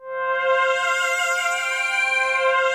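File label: FILM MUSIC C5, PROPHET VS; Greytsounds VOL-1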